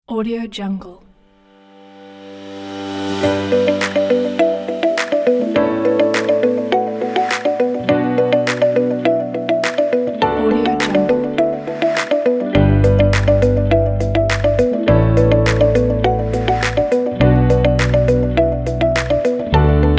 luxury motivational corporate

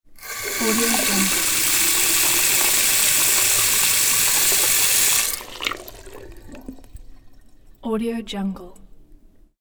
tap water

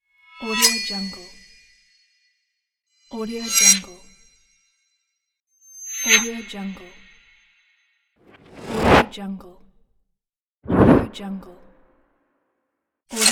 action transitions

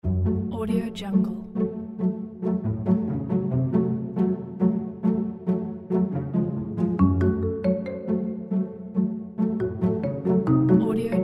quirky funny

